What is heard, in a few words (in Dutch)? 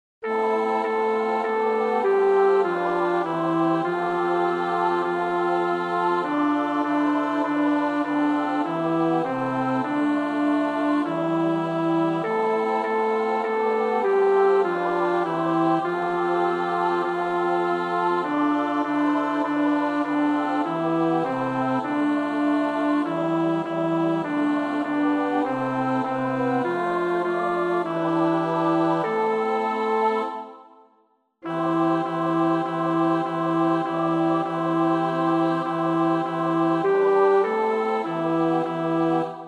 Onze Vader (bas), Oefenbestanden; Onze Vader (S-A-T-B)